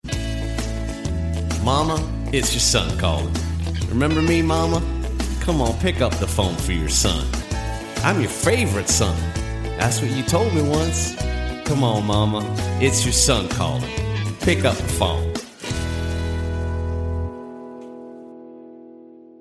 pick up phone song ringtone

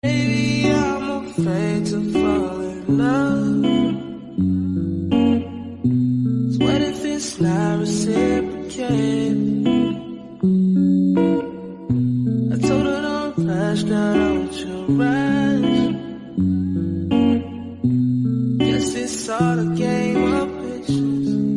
most romantic english song ringtone; iphone

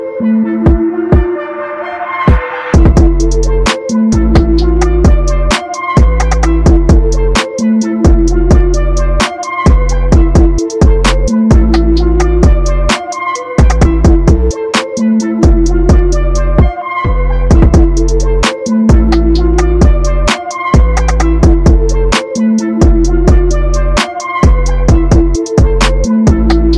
arabic ringtone

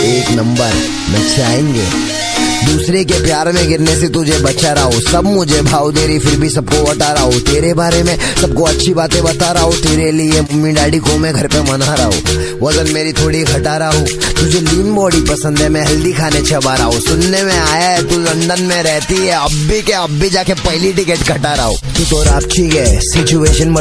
attitude english ringtone; english ringtones

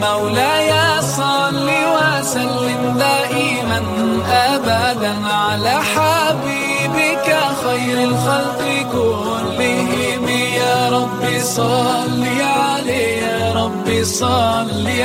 islamic ringtones

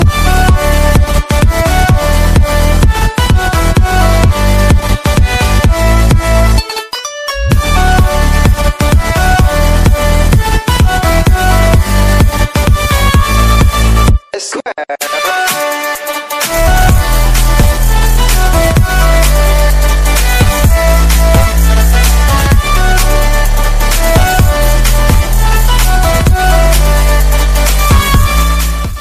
music cartoon ringtone

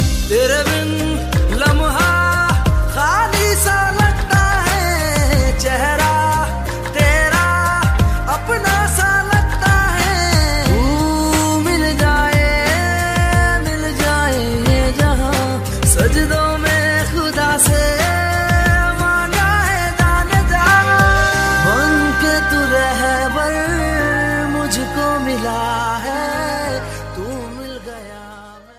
bollywood movie ringtone

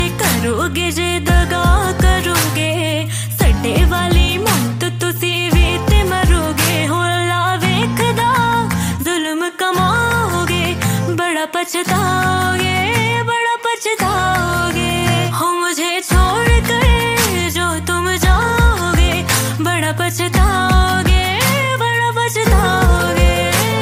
romantic ringtone, bollywood ringtones

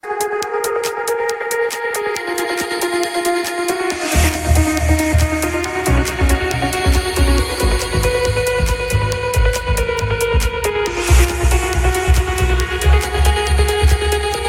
dj ringtone

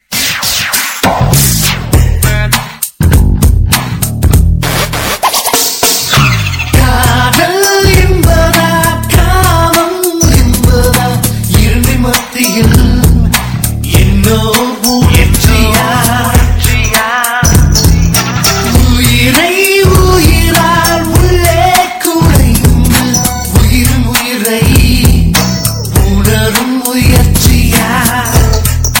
love beat ringtone, bollywood ringtones

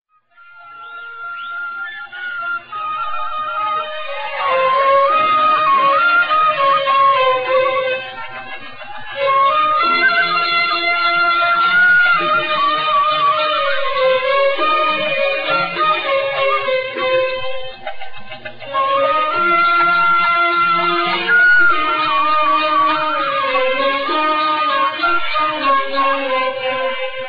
iraqi sad music ringtone

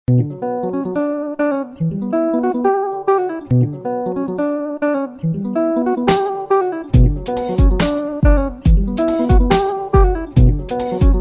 style guitar, ringtone

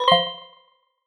sms tune ringtone

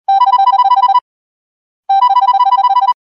desk phone ringtone

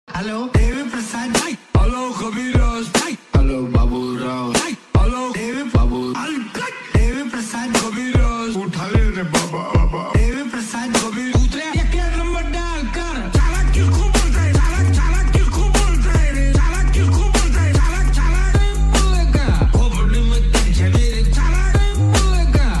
funny remix ringtone

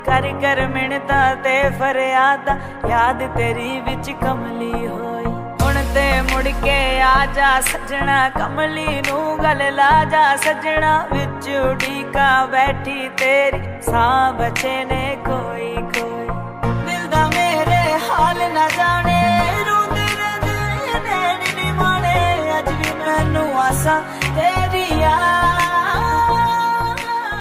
sad love ringtone, bollywood ringtones

